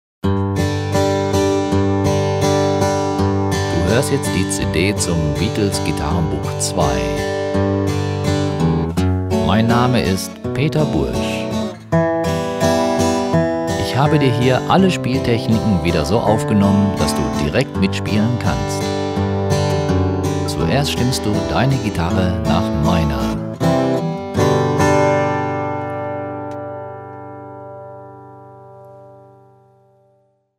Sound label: beat